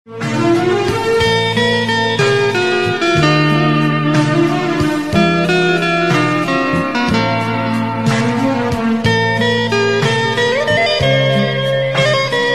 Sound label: Ringtone Mobile